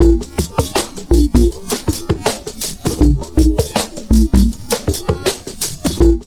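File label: drum loops crazy processed, 80 bpm; USB Soundscan vol.07; AKAI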